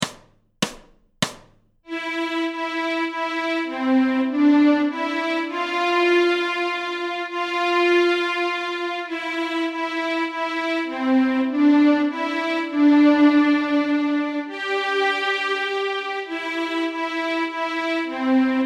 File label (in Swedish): Beautiful Brown Eyes Stråk C, Fiol; Notträning